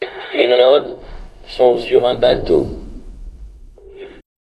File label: jingle